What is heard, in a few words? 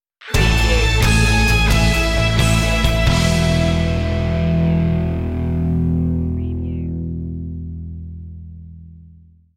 inspirational stinger